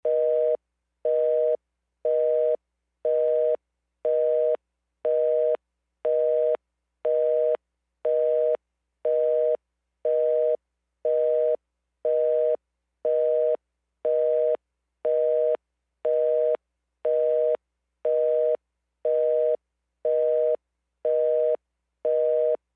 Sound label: Telephone busy signal, buzzing; tone; Futz Busy Signal